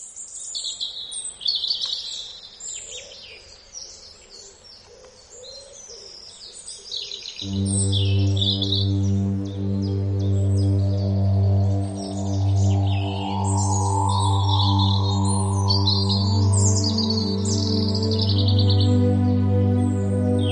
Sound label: Bird song; Relaxation vol.1 - Nature